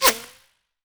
bullet whiz; Escape from Tarkov

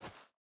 footstep boots dirt jump start; Rust

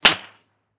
bullet flyby pistol; Rust

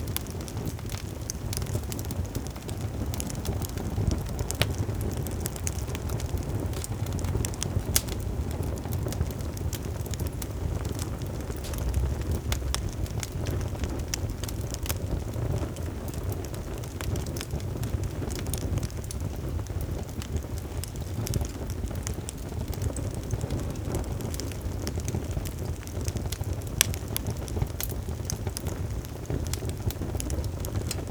campfire loop; Rust

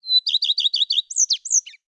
ambient; World of Tanks

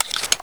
Inf Weapons Foley 3rd; Red Orchestra 41-45

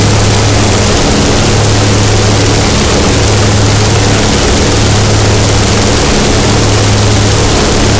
engine bomber rpm close; War Thunder; engines

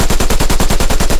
loop rps10 besa origin, guns tanks

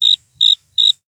Amb Animals; Red Orchestra Ostfront 41-45

